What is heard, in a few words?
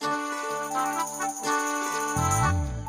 Medieval Intro; Diner Dash Flo Through Time